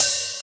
open high hat d key, Royality free open hi hat sample tuned to the D note. Loudest frequency: 8641Hz